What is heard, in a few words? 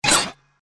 Melodic increase; WeMod